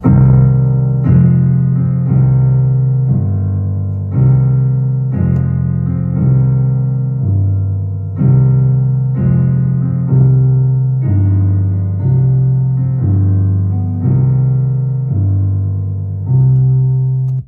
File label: C-Minor Clean